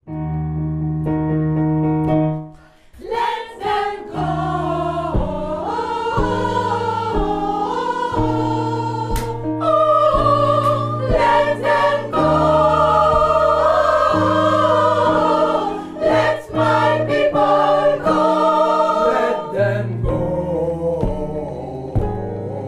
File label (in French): partie tous; gospel